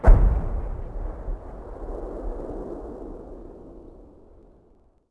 distant gun; WAR

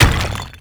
medium impact stone; WAR

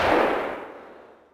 mg bren ring out; WAR

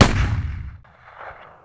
m1 garand; WAR

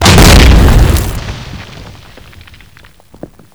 grenade; WAR

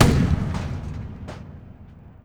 tank gun; WAR

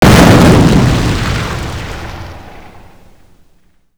small explosion

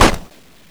30cal; WAR

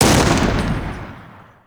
axis anti air; WAR

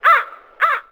crow

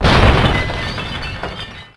destroy debris; WAR

